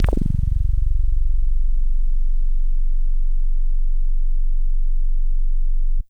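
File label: Synthe Bass DX100 F#2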